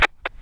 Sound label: Walkie Talkie End Transmition; SFX; IRL Recorded